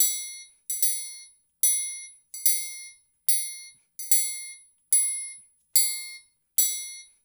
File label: percussion loop; InVision Interactive; Percussion Slam; KWICKIE